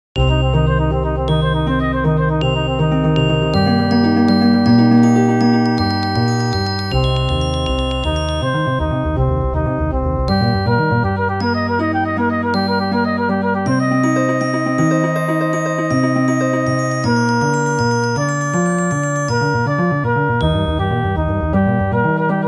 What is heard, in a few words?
seven equal chord progression